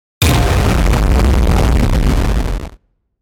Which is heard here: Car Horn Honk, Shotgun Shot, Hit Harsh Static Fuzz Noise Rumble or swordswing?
Hit Harsh Static Fuzz Noise Rumble